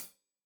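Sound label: hat closed, hi-hat cymbals stereo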